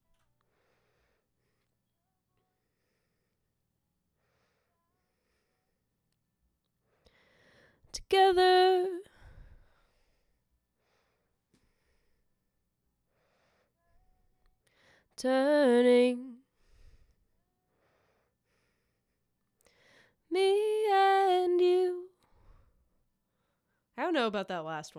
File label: vox harmony